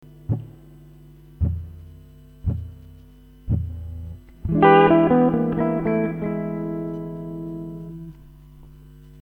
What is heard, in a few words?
Blues Guitar Licks Example